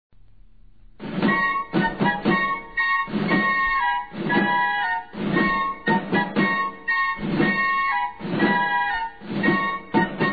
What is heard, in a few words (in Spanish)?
Marcha de fusileros; ORDENANZAS CARLOS III; 1769; TOQUES DE CARLOS III